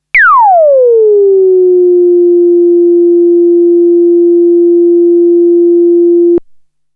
Self-Osc VCF C

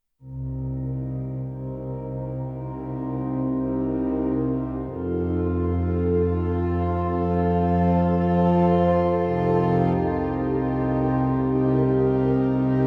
A8 Quantum VEL AT; Modal

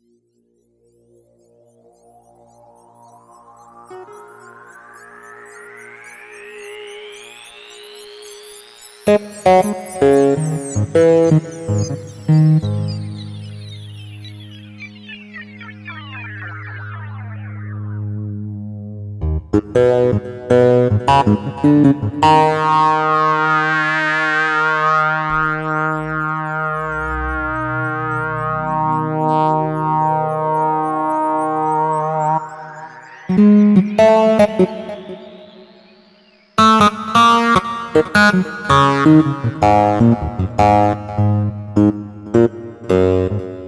solo with wave

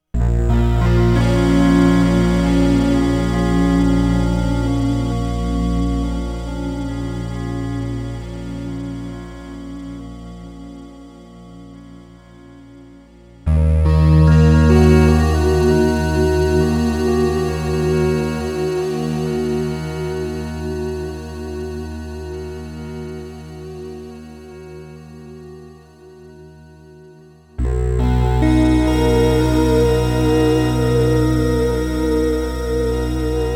ppg wave long notes

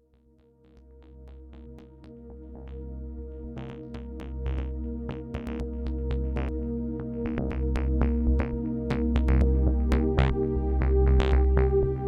A8 Portamento Sequence

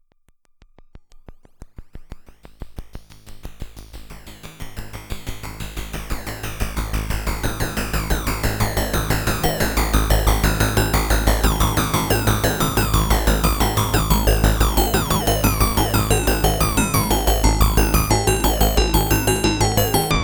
1 VCO LPF Ext Filter FM; Dominion1

